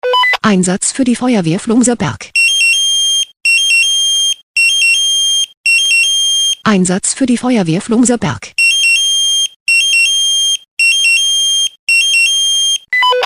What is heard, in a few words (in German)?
SMS Alarmierungstone; FW Flumserberg